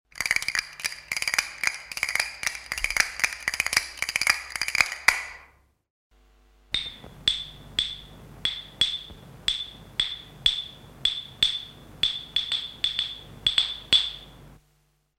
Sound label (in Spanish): castañuelas y claves